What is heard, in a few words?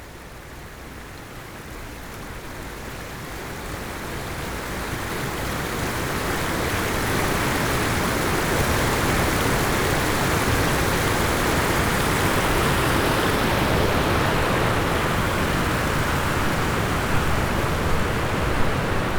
ambient